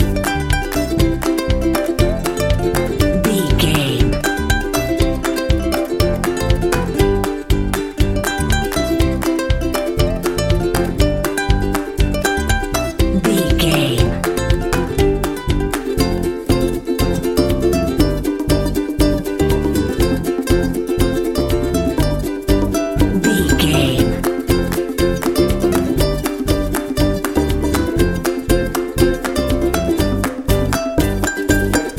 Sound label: super happy fun long loop